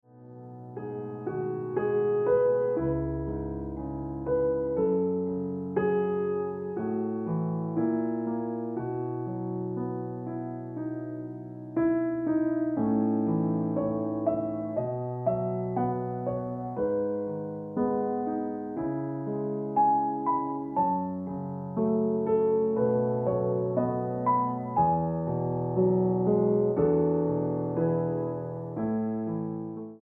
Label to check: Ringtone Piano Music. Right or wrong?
Right